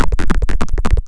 Vactrol Stampede; 300 Drum Machines; DevSnd

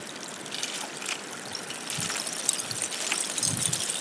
Icy Stream, On The Glacier

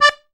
ZUPAN STR, AKKORDEON; Best Service; Alpine Volksmusik

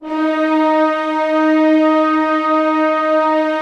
ORC D#3 FF, Orchestra; Sound On Sound - Peter Siedleczek's Orchestra

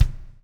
BLUES TK, Backbeat; Spectrasonics - Backbeat

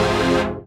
Fm Dub Chord

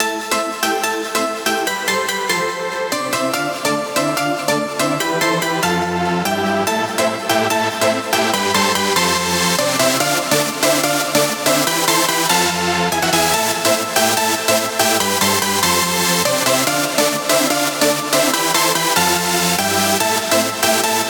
144 BPM Chorus - Lead WET; Vengeance - Essential Clubsounds Vol.5; Flight Kit Root Amin; Melody & Synth

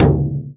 BASS, INDIAN PERC

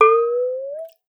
water; Xsample VOL-9

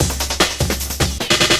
LOOP, Drum & Bass, 150 BPM

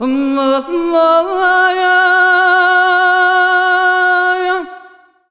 THA VOICE L; USB Soundscan vol.63; AKAI

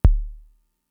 Kick, Drum Machines Fricke MFB-522

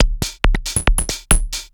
percussion loops 138; PS-45

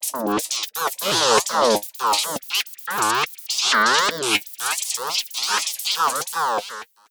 Alien Chatter, sci-fi

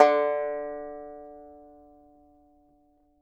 banjo D3, banjo notes; Sampleheads - Whole Lotta Country 1 & 2

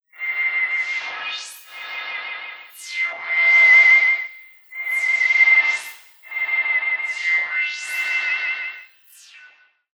Filtered Noise, radio signals

